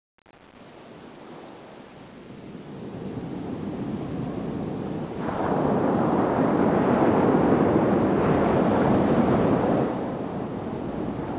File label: waves